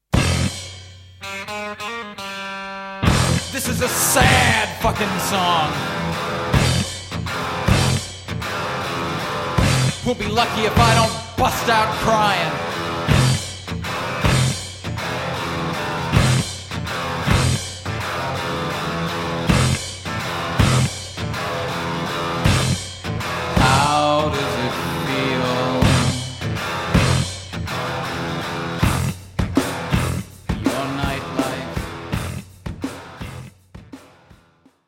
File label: squirrel song